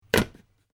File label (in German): schliessen